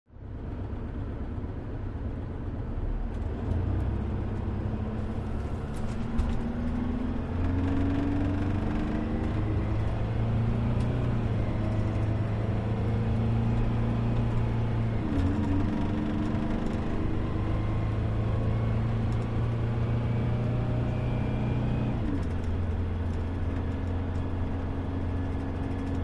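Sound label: Bus Interior Idle Accel, Bus Interior - From Idle To 50-60 Mph; bus station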